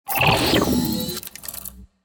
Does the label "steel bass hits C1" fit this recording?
No